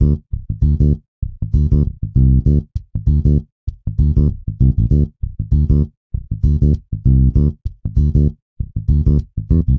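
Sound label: Bass R&B D major 98bpm; Guitar and Bass; Ableton; Factory Packs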